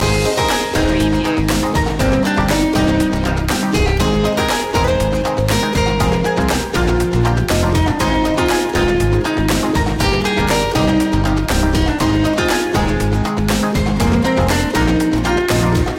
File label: flowing rhythm loop